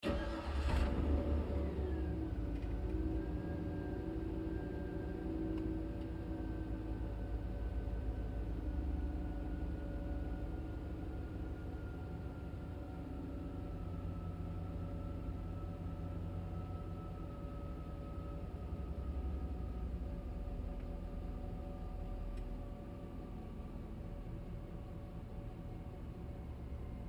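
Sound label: Truck GMC Savana Interior Start Idle Off; Trucks; Vehicles; Big Room Sound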